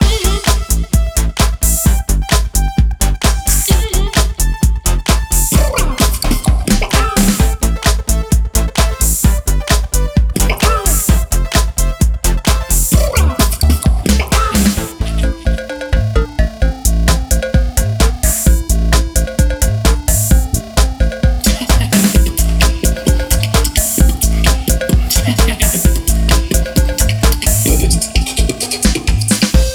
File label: menu loop 130bpm